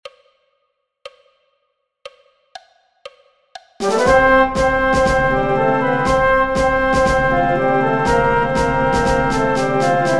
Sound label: Joyful Processional Orchester